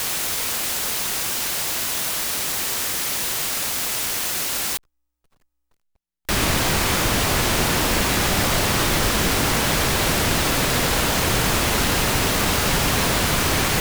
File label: noise; MOTM; yusynth